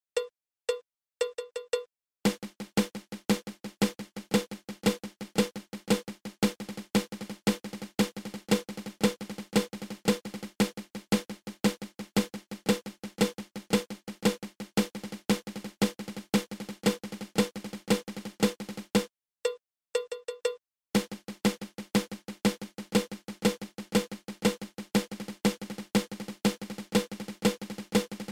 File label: Flam Drag